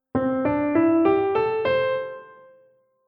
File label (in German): Tonleitern Pentatonisch; pentatonischen Tonleiter; Diese besteht aus nur fünf Tönen; Paradiddle Kapitel 1; Musikschule »allégro«